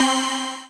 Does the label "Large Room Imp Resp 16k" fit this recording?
No